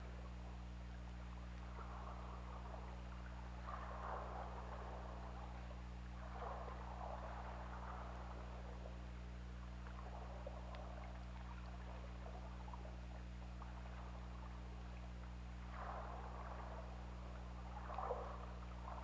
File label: Orca; OrcaLab